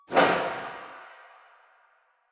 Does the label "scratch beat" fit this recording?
No